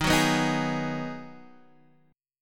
Ab Minor 7th

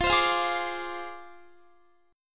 F Minor 6th F down Guitar

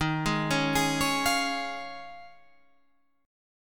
Eb 7th Suspended 2nd Suspended 4th Eb

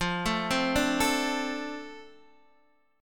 F 6th F down Guitar DADGAD

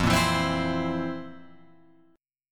F Major 7th Suspended 4th, Guitar, Open F

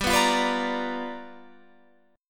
G 7th Sharp 9th G chord, Guitar Open F

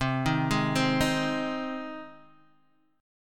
C Minor Sharp 5th, Guitar, DADGAD; down; chord shape

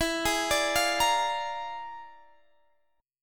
E 7th Flat 5th Flat 9th; E7b5 B9; arpeggiated

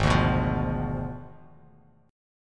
Ab Diminished 7th, Abdim7 chord, Bass Standard; Abdim7 strummed; JGuitar

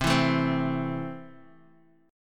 C 6th C down Guitar; strummed

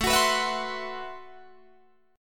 A Minor 6th Add 9th, Guitar Open D

